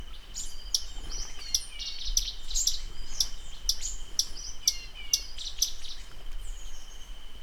Todirostrum cinereum Titirijí Común

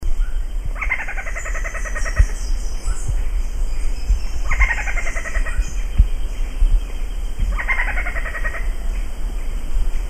Quetzal cabecidorado Pharomachrus auriceps

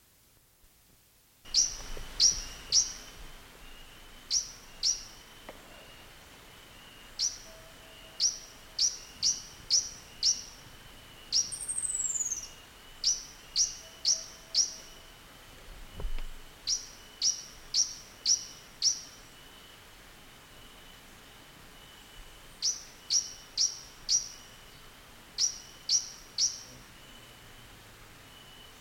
Boissonneaua flavescens Colibrí colihabano

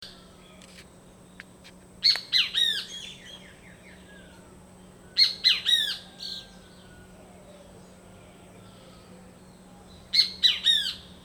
Pitangus sulphuratus sulphuratus Bienteveo Común

